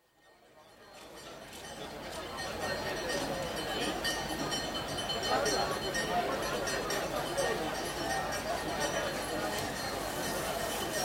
Niger: Niamey, market atmosphere, with traffic & bells; Outdoor Sound Effects; BBC